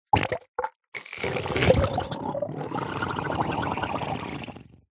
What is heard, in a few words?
cartoon slime squelch gurgle drain; zapsplat